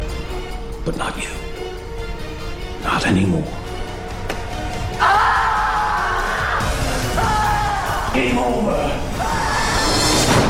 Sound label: Game Over Saw